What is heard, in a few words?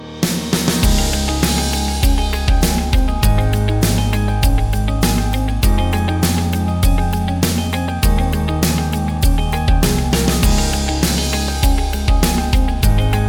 Treble Boost